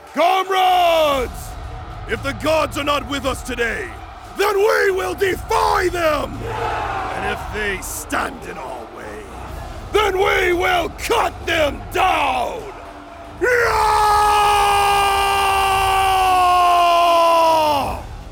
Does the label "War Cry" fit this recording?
Yes